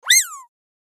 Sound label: mouse squeak